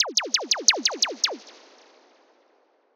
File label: lazers; B Movie; transition